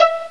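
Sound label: Pizzicato (E4); X-Wing; X-Wing Patches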